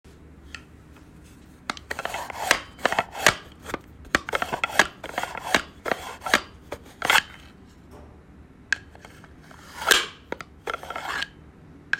pencil case

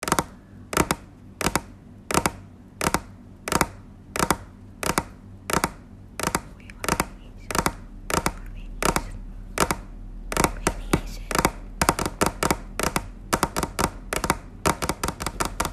Nails

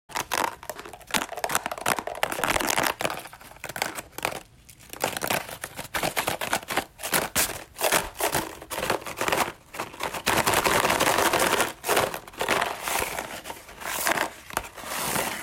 Paper Instrument

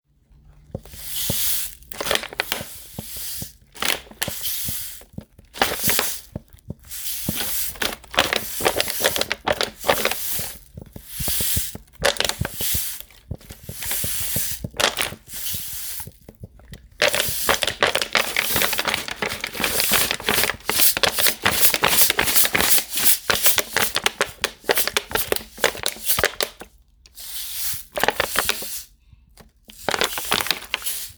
Solid and Liquid

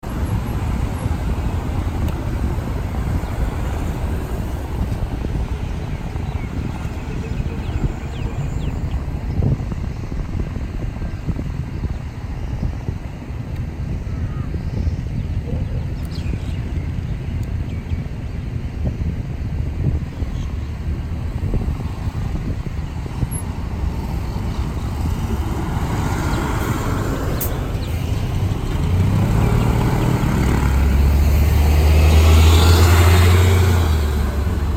street sounds spatial

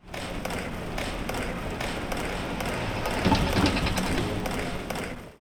Binaural; H3-VR; Archival Binaural

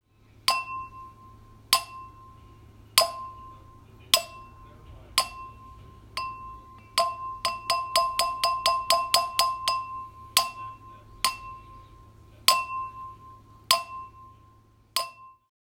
Glass Chimes

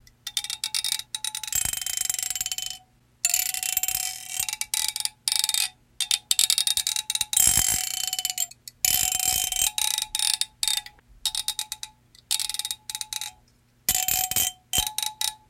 Comb Teeth

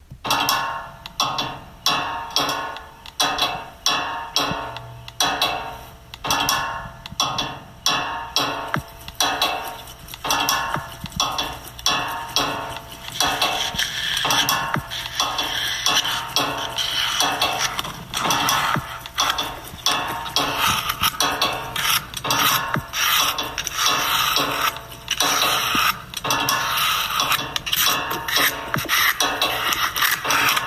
Ensemble

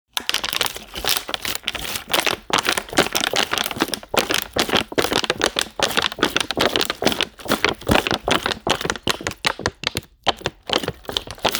Water Bottle

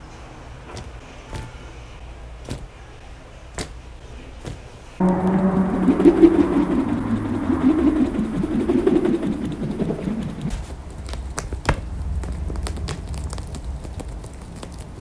rubber

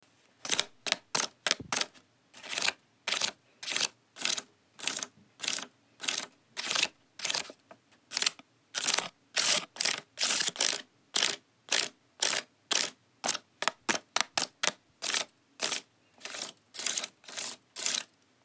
Paper Instrument

